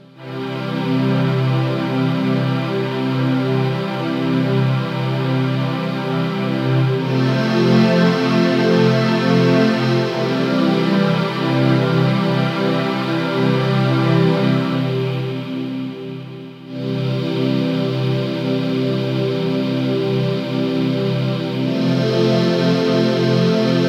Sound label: Ahh, Kawai K1 preset